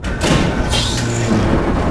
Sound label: tram gears start